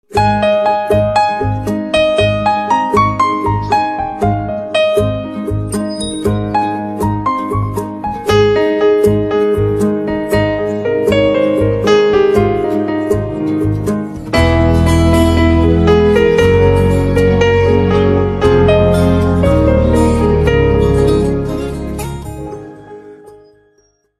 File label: Piano Instrumental Ringtone